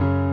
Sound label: piano low